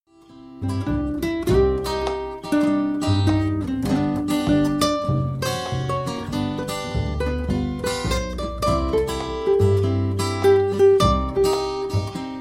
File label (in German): Trio entspannte Autofahrt